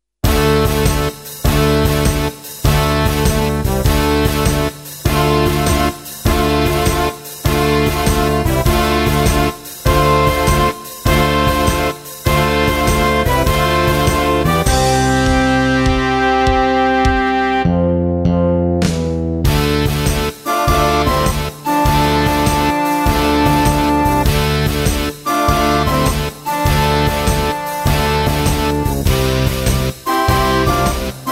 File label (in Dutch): blokfluit; Heavy Metal